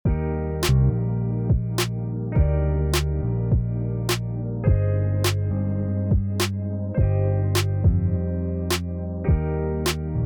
guitar thing; weekly beats 2020

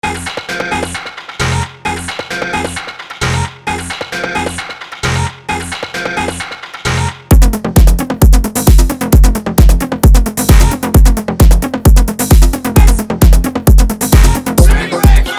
132bpm; spring break

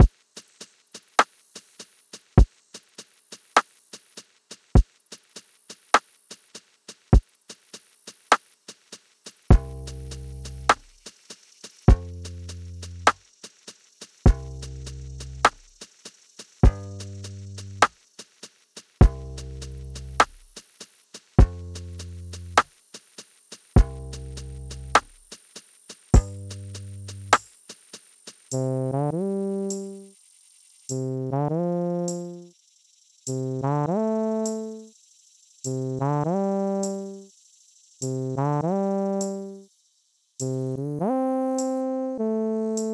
animal crossings type beat

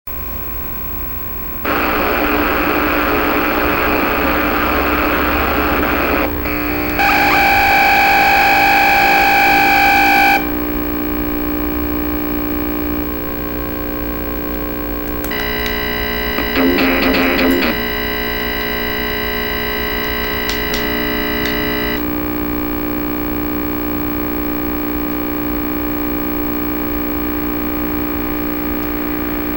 fieldrecording c64 loading decrunching; weeklybeats-2020

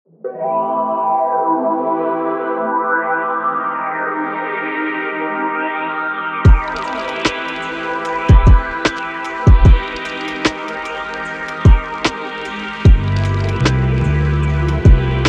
psychedelic lo-fi synth; weeklybeats 2024

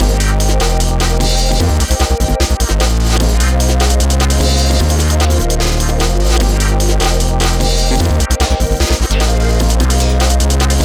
autoregressive noise; weeklybeats 2024

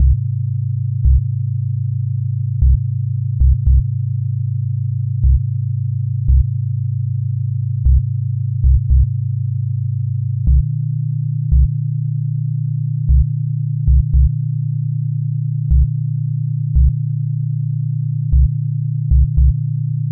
washing machine space adventure

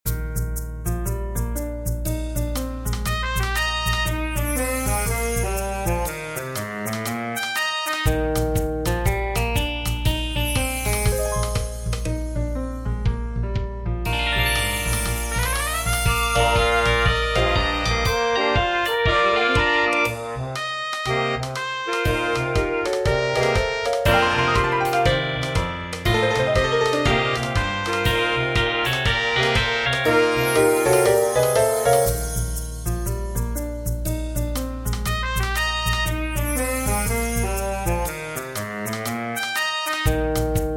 jazz; paws

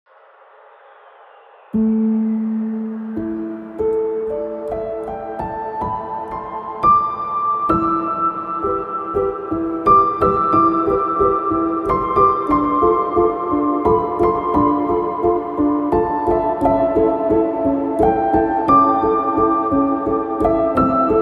melody for rubidium

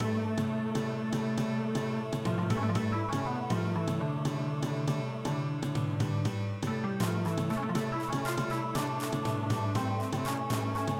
midi sketch; weeklybeats 2024

